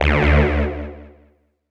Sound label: Synth Hit D; Zero-G Trance Inducer; 90 s Sample CDs